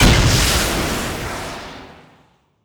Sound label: fire; Rust